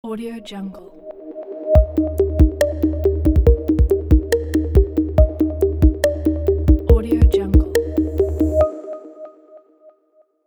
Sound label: technology glitch logo